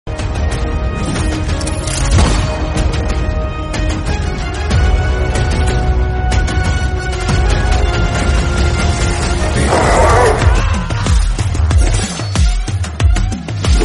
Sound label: Climax Bgm Ringtone; Leo